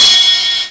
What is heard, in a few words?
CYMBALS, Disco House; AKAI; USB Soundscan vol.20 - Fresh Disco House I